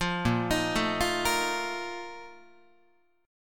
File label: Bb Major 7th Suspended 4th, Guitar; arpeggiated